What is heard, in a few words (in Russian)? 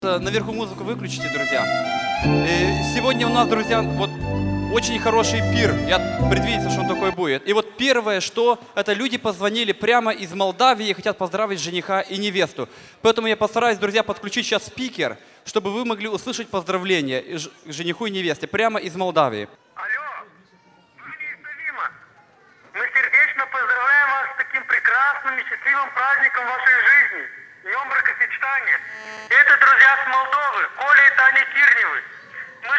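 Звонок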